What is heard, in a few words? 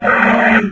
zombine die; npc; source engine; episodic